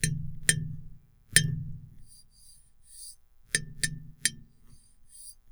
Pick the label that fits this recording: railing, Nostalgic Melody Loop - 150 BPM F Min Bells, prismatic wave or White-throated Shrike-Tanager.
railing